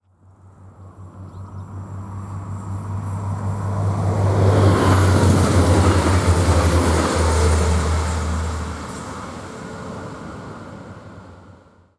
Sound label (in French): trains diesel et electriques; sonotheque